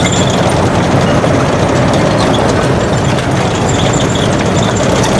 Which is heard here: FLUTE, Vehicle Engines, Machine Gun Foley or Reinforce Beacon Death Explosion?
Vehicle Engines